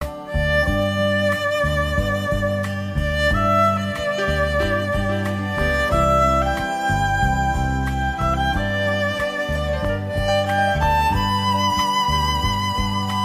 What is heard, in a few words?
instrumental ringtone